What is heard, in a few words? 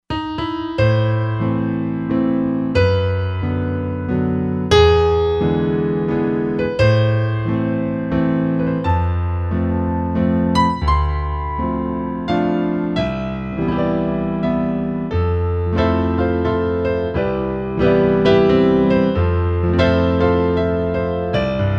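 Piano Natural Grand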